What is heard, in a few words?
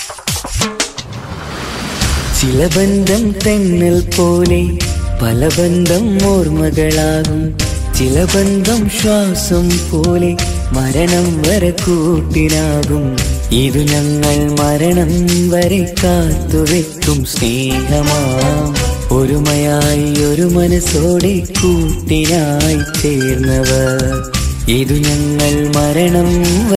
music malayalam ringtone